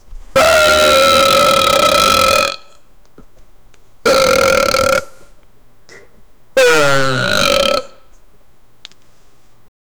man burp long loud disgus

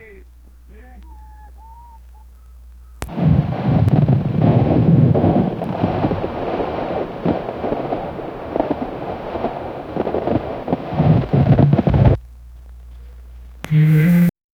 distant wind for cold ser

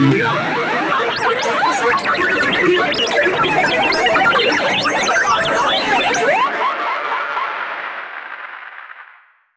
make the earth explode

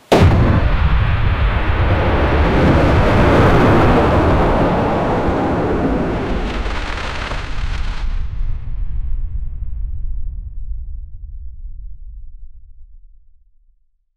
rocket launch sound, blastoff

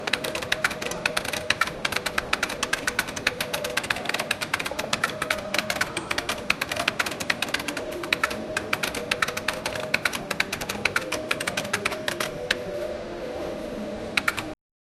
busy customer care office, ambient noise

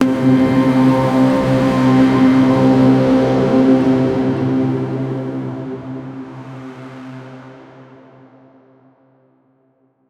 landing on a invisible pl, abstract

